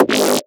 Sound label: Kick Kick N Scratch